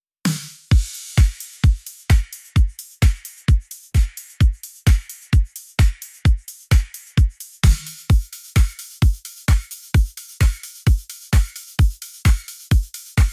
Drums

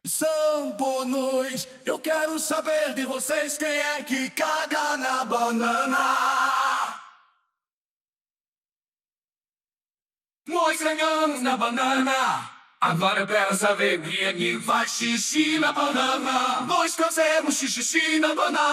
Vocals

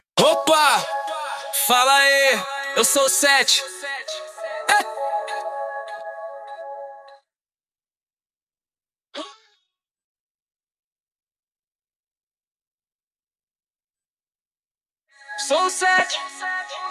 Vocals

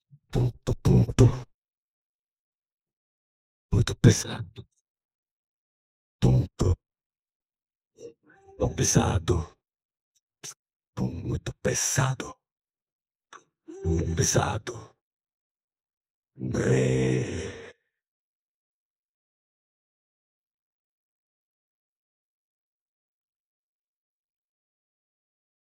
Vocals

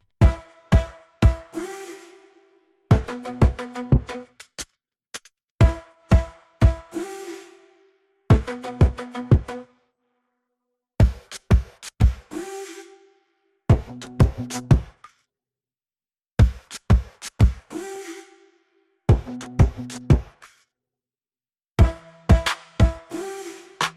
Drums

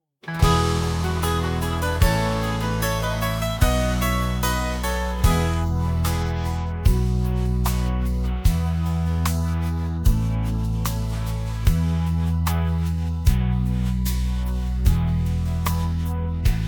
Instrumental

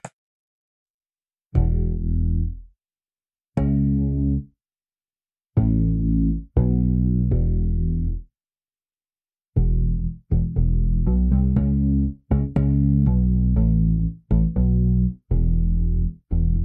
Bass

